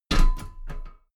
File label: step metal ladder step top regular